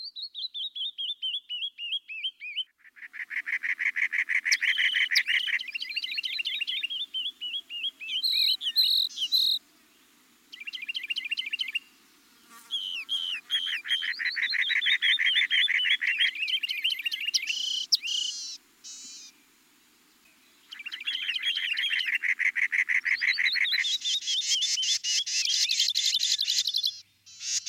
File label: wren mix; animals